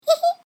happy; Flashpoint; tobiidynavox; catchme

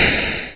tile explode; doublespeakgames

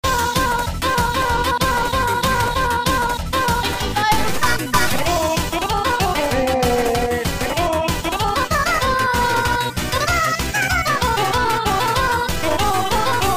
bgm; sitappagames; cookie

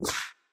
dash; CrossCode

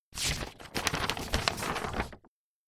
unfolding letter; pond5

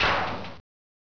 low crash, mad cars

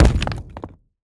rock burst; battle; CrossCode

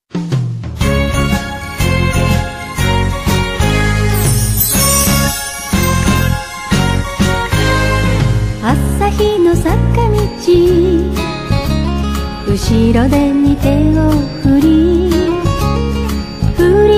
bgm; sitappagames; cookie

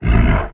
kramp growl; halloween

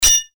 no damage; patcheconwarsdx

